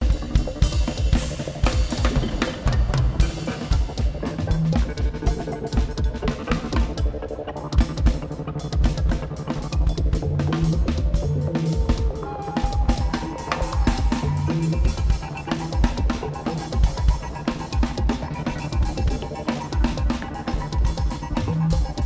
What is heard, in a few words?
Drums